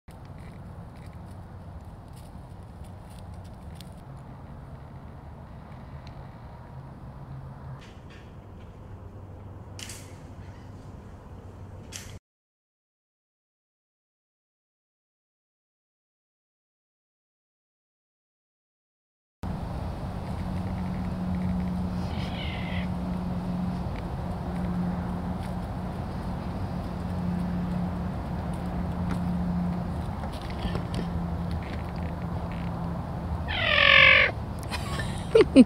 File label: Cutest Big Cat Sound